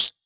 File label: Tech Drum Hit, techno 120bpm; musicradar; Drum Hits